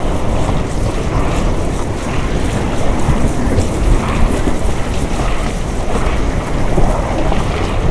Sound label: water pipe; de dissonant